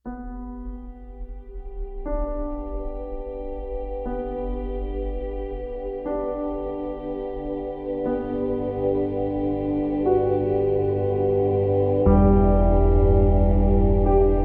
Beauty Ambient

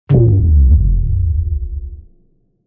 Transmit Hit Downer; Ninja Tracks

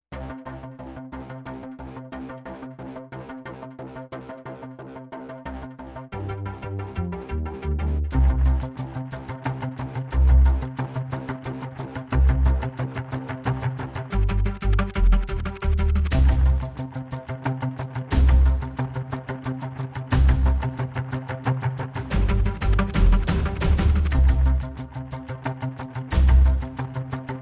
No Orch, epic builds and action

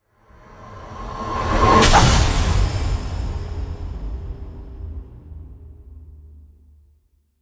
Radioactive Hit Downer; Ninja Tracks

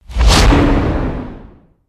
Thwack Wipe Wipe; Ninja Tracks

